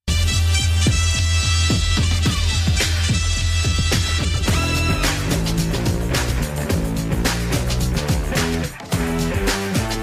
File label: Ringtone; Brooklyn Nine Nine